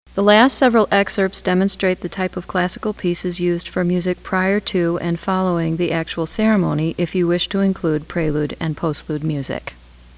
Classical Samples pianist; wedding

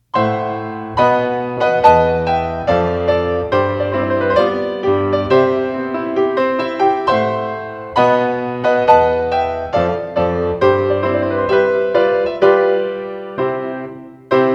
Wedding March, pianist; Mendelssohn

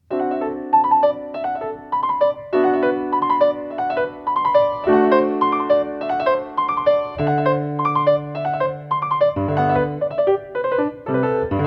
Arabesque II, pianist; wedding; Debussy

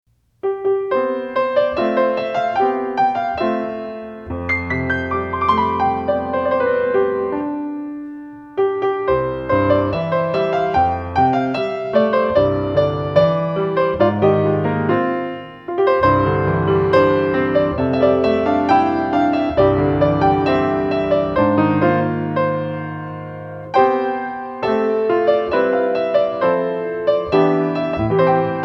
Tis a Gift to Be Simple, pianist; wedding

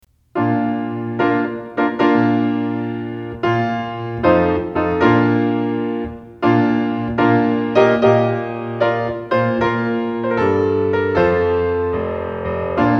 Bridal Chorus, piano; wedding; Wagner

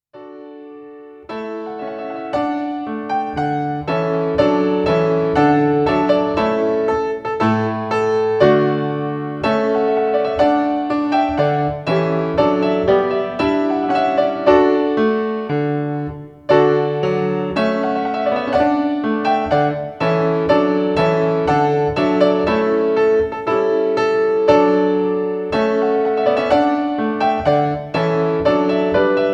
Trumpet Voluntary pianist; wedding; Clarke

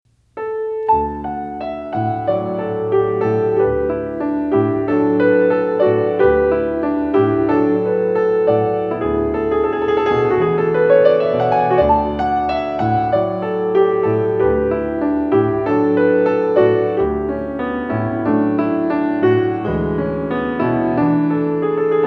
Romanze II Brahms, pianist; wedding

